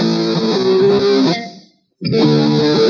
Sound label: guitar, Loops, Phonky